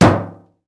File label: metal; Mafie 1